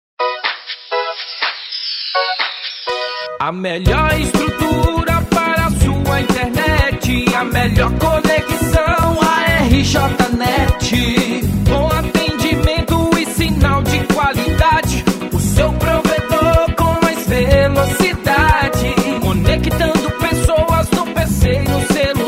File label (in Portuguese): Jingles Para Internet; Pop Internet Van Hal; Musica Para Provedor De Internet